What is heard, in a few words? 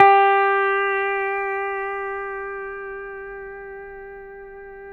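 pedal steel G4 right; PEDSTL NOTES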